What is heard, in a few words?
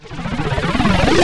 FX Scratching; vengeance essential club sounds-3